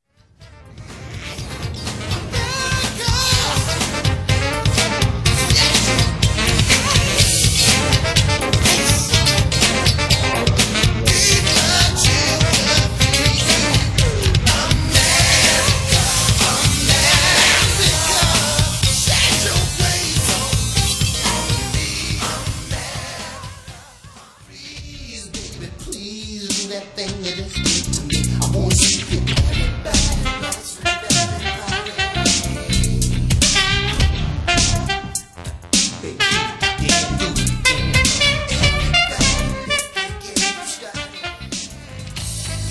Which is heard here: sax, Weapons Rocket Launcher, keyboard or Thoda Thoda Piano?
sax